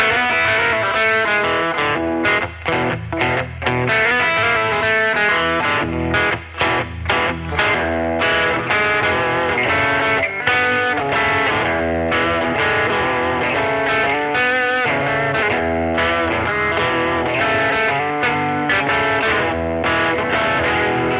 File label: Electric Redneck Guitar Only